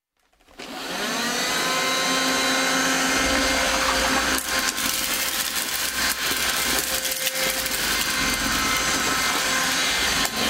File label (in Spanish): Aspirador